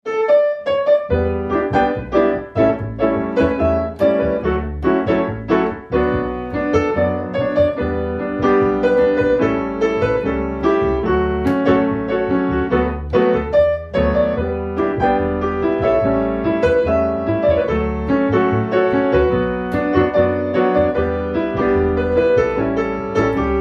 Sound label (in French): Duo piano et contrebasse